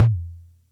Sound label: Music Percussion Computer Tom, Drum Machines